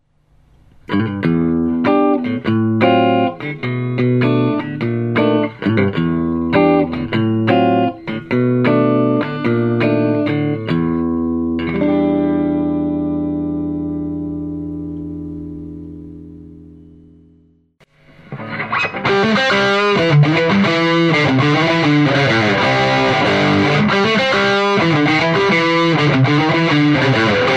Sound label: Guitar; ModernM